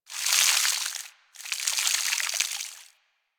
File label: plant watering; Warframe; Hub; Tenno